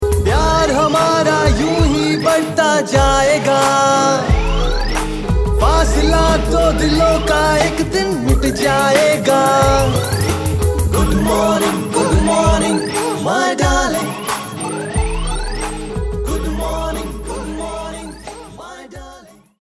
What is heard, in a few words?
GOODMORNING HINDI MALE